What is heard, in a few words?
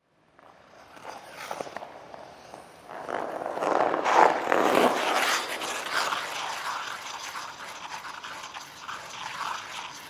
Ice Skate Spin, Ice Skate Spin Twirl Rink; Ice Skate Spin Slow To Fast Long Twirl Rink; Ice Skating; Sports